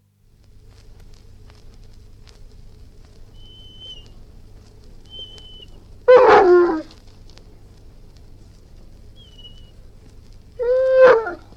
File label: Irish Wolfhound, howling, barking & whining; Part of Dogs (Sound Effects:Animals); BBC